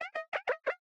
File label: idling; stray; Zurg